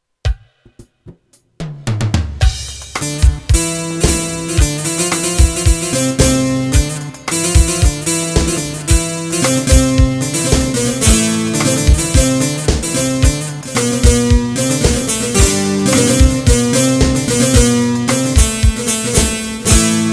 kuru saz; korg pa 80

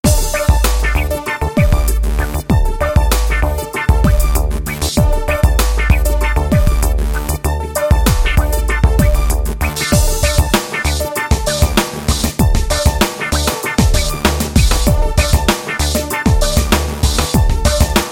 Cepki, yeni ritim

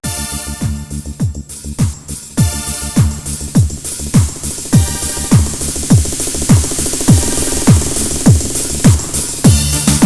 halay, bk5

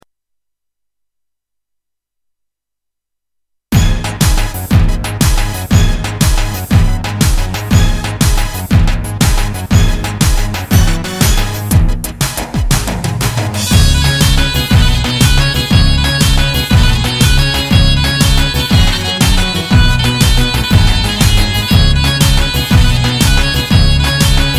gw 8 6 8 techno halay

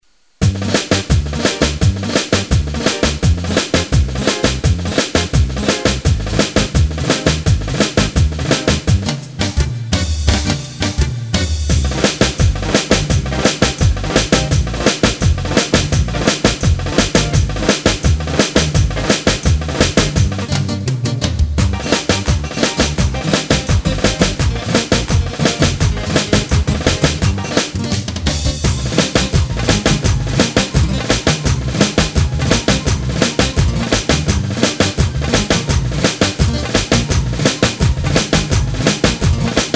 kocek

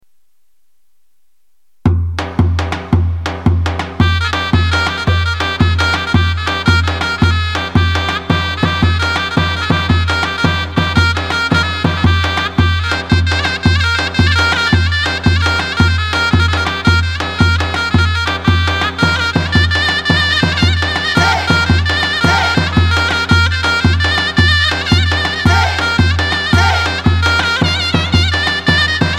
erzurum, davul zurna ritim; sx3000 erzurum 6 8

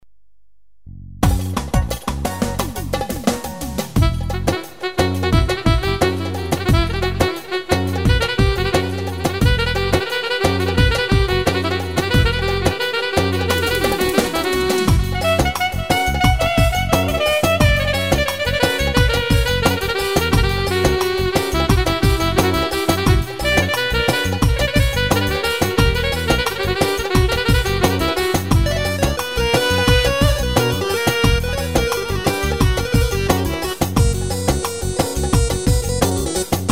sx ciftetelli, sx3000 ritim; yeni ritim demo

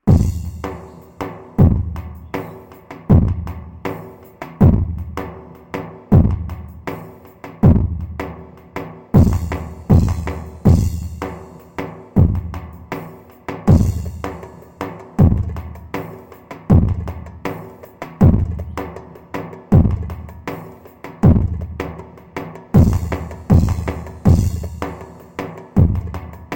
pa 500 tr aydin zeybegi, korg; KARIŞIK RİTİMLER

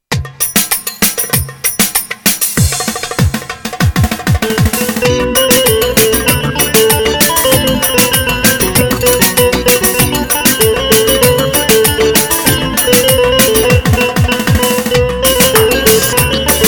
kesik cayir; roland gw-8

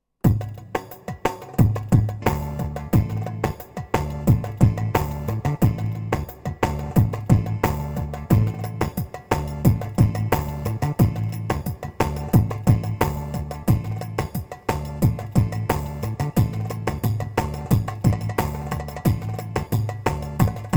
tr ciftetelli 4, korg pa 500; tr karisik ritimler

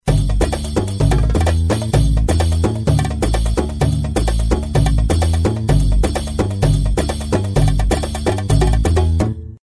kesanli ritim denemeleri, gw8 ritim

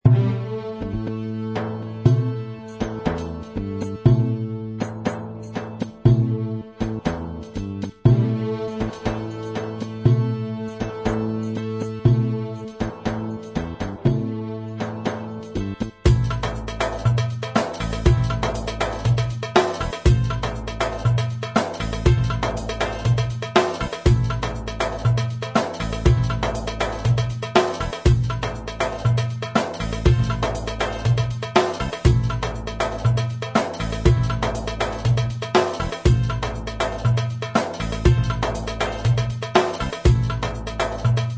style arabesk, oriental; gem genesys pro oriental

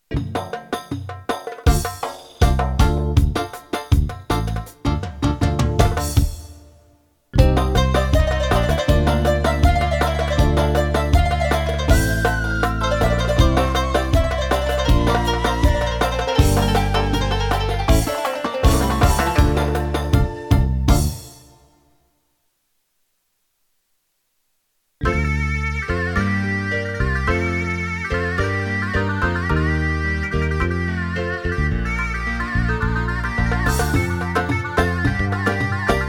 Ketron XD9 ritim

